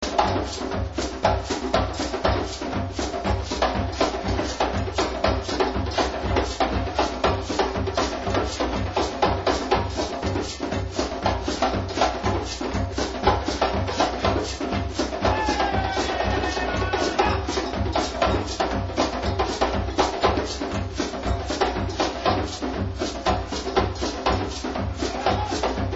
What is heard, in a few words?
Disco